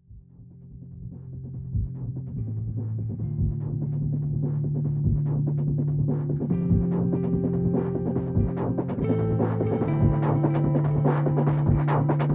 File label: psy trance remix